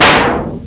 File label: blast; casino